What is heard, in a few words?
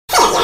failure